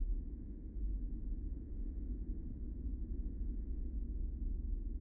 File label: Traffic City